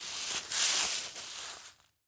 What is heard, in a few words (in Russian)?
pokos suhoj travi